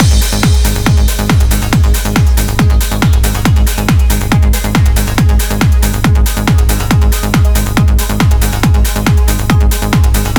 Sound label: 139BPM Complete Mix, G#minor; Vengeance Samples; Brandmark Kit